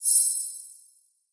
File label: generic shimmer; Candy Crush Friends